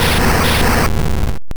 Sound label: Ember Hit; P3D-Legacy; Battle